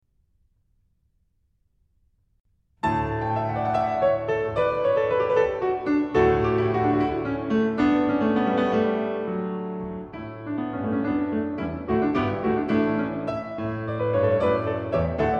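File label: Norvezhskaya melodiya, Norwegian melody; E.Grig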